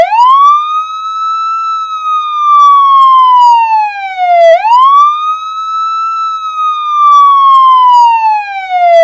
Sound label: gen 2 wail; SHO-ME SIREN WITH REMOTE MINI-CONTROLLER; Siren Tones